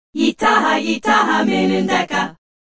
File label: style, African Vocals Sung